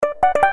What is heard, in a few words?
good news ringtone